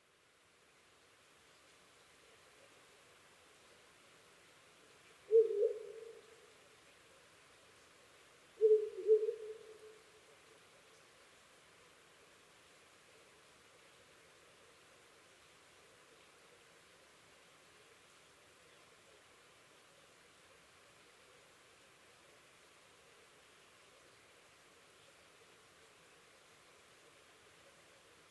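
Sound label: Ural owl; fukurou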